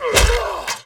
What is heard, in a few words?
Ambiences, Places and Ambience